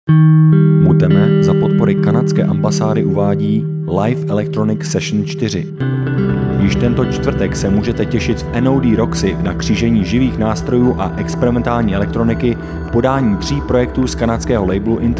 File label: live electronic session